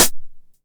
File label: 909 HH Tape Sat